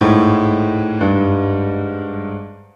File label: smoker bacteria, music; Left 4 Dead 2; Source Engine